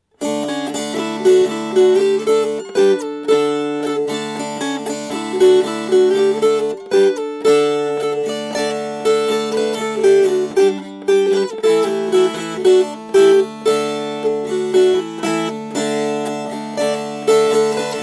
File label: Strummed